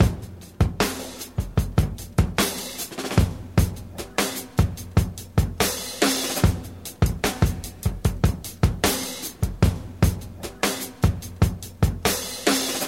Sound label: 75 bpm fresh drum loop sample A key; Duration 0:13; Samplerate 44100 Hz